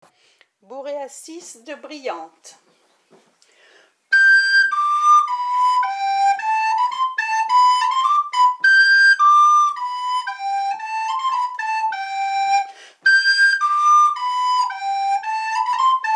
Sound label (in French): flute